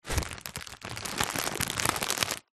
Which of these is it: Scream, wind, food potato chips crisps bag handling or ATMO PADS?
food potato chips crisps bag handling